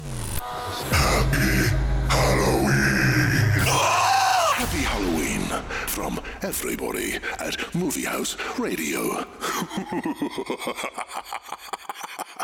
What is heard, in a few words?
Long Stab; Movie House Radio; Happy Halloween